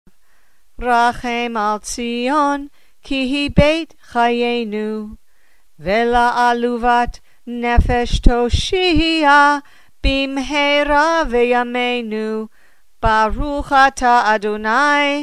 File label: before Haftarah